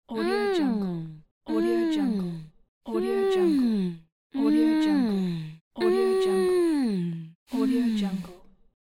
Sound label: teenage girl hmm thinking idea